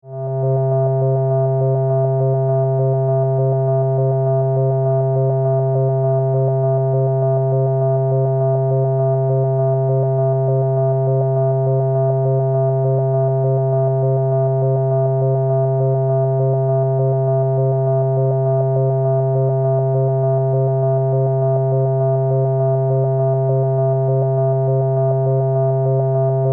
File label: rhythm